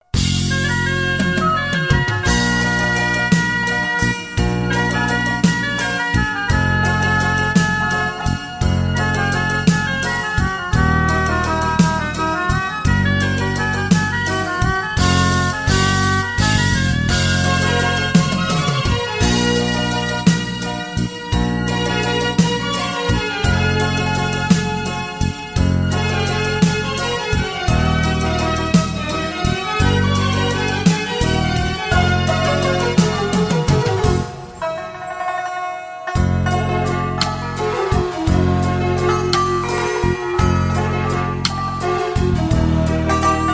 Santur

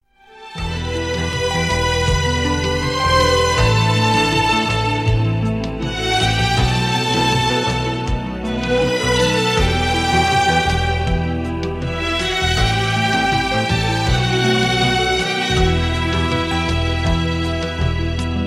Accordion

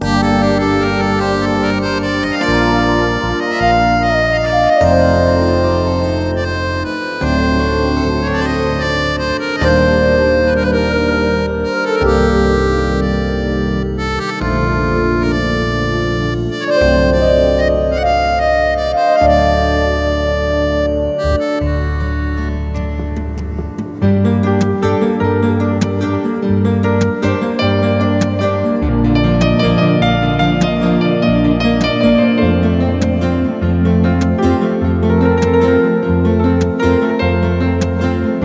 Piano

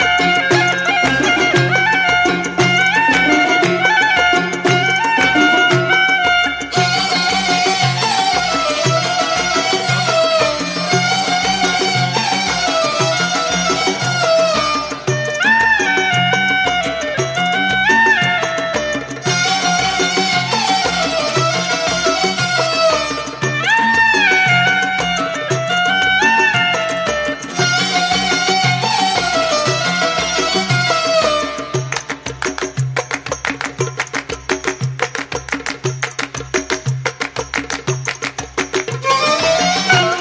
Saxophon

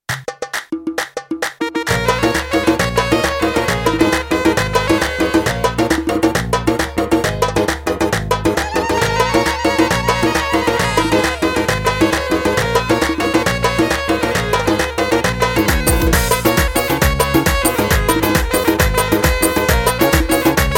Melody

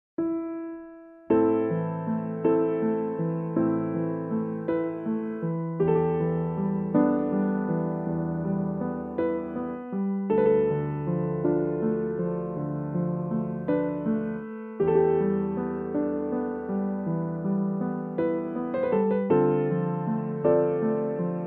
Piano, Vocal Melody

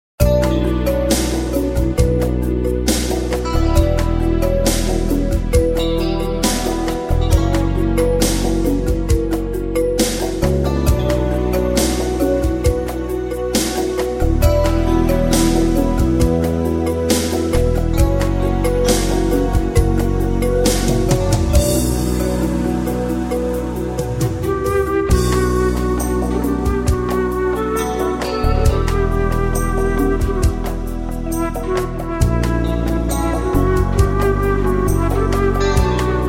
Flute

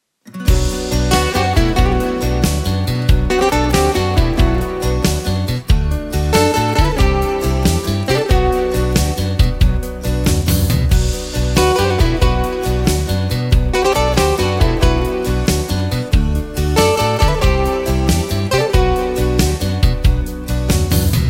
Guitar

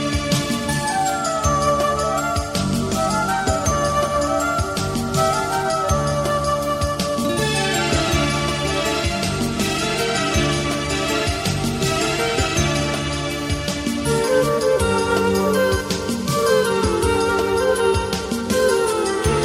Violin

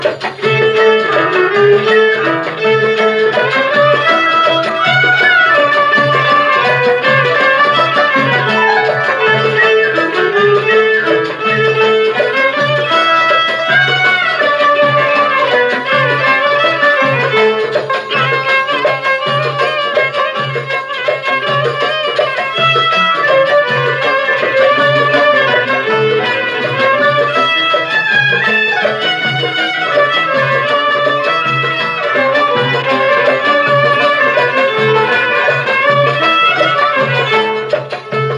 Violin

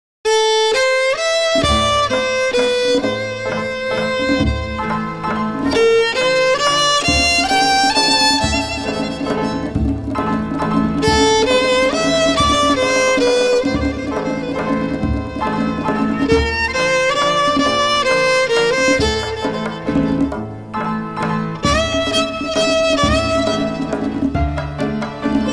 Violin

